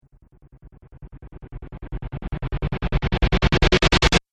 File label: Cinematic Riser Abrupt Stop